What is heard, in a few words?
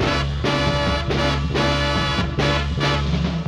riff